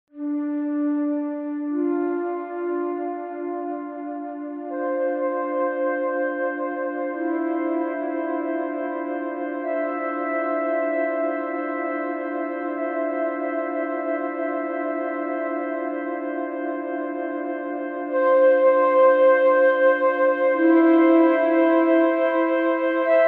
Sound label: Exploration Music